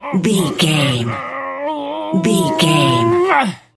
voice male effort push slow

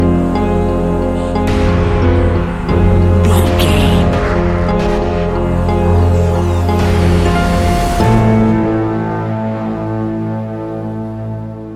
modern horror

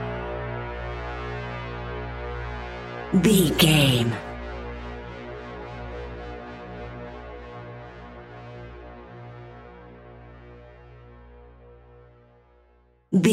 nocturnal lullaby modern horror stinger

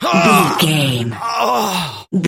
voice male death

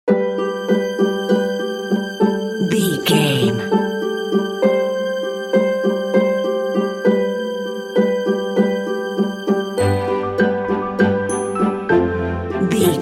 sentimental soft underscore

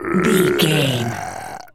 zombie voice groan

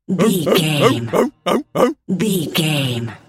seal walrus bark

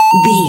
retro collect pickup coin

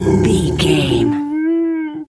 bear pain whimper

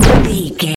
energy blast small

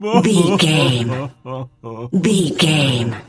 voice male cry low

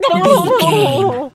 turkey ostrich hurt gobble

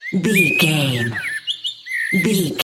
bird small songbird call chirp

